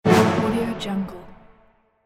horror brass hit

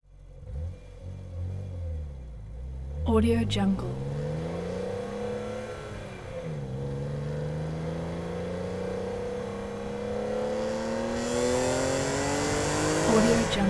2002 bmw m3 car int; prosoundfx

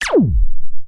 laser; prosoundfx

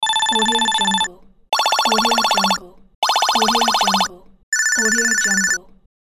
8bit point counter pack

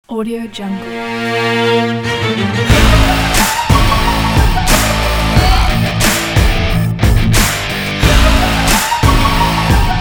east beat

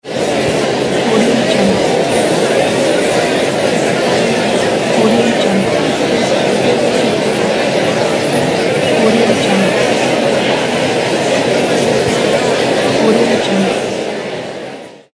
crowd noise

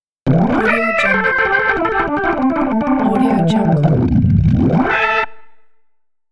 organ shred virtuoso

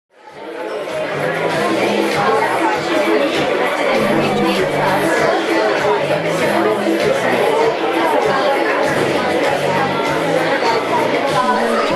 bar noise; pure-noise

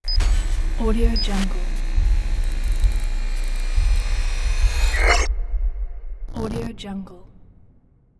glitch logo

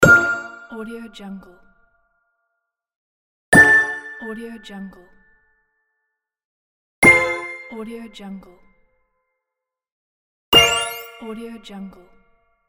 punchy pop choir dings

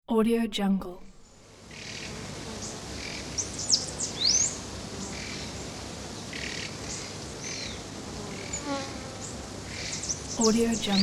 forest afternoon

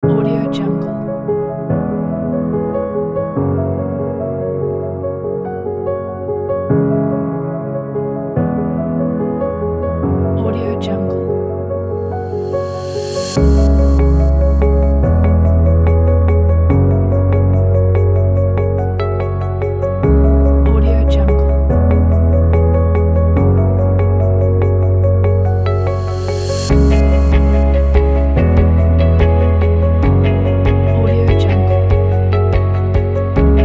documentary; artmusician

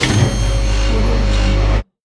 mechanism

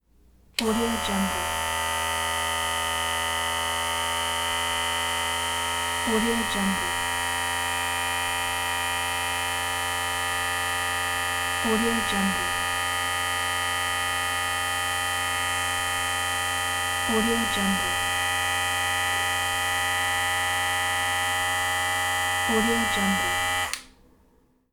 electric hair clippers idling

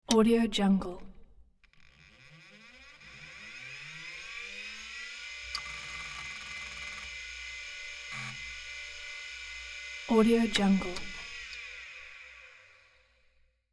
computer startup with aborted boot up

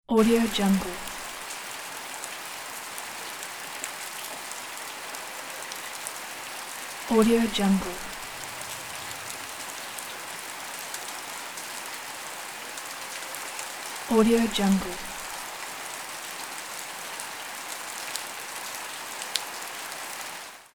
rain; dakochan

